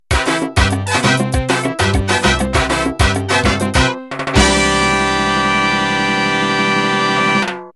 Mambo End

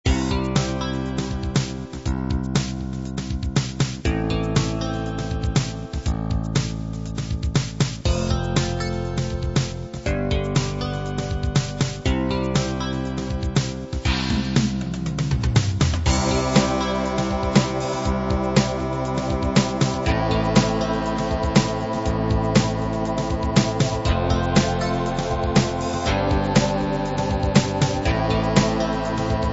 fatback groove

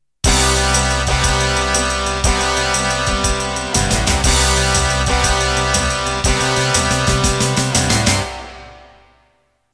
Shuffle Intro

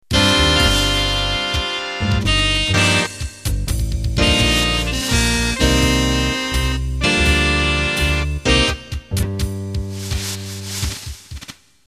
Big Band Slow Intro

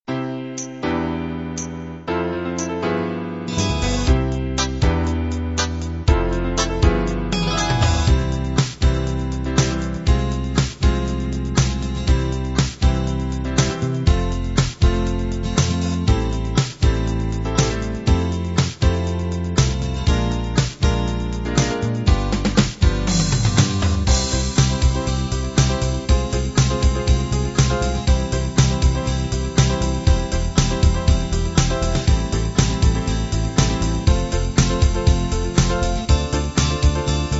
half 8-16beat combined

